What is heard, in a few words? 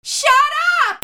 PEOPLE